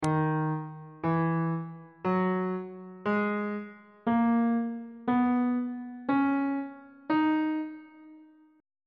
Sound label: d sharp phrygian mode note positions on bass clef